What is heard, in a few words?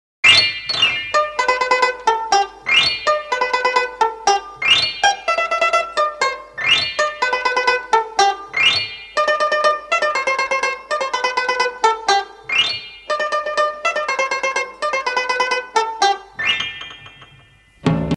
banjo